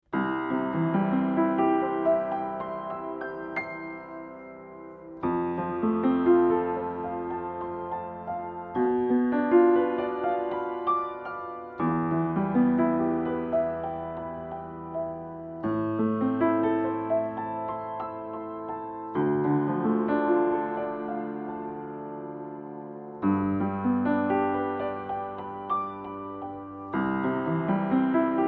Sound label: 414XLS Piano MS Mid Signal